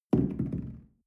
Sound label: shell place on tablecloth wobble medium; soundpool